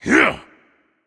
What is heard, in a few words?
grunt attack; chaac